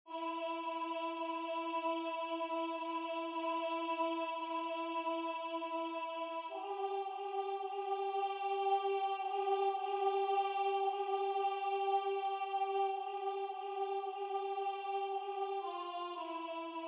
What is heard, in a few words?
Alto; Xaramela; Noël Ukrainien; Anonyme – XVIIIe S.